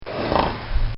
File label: Horse Snorts; Animals; Bobby Cole